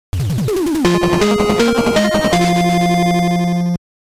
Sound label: Fanfare; Battle Kid 2 Mountain Of Torment; nes